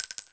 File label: shell, player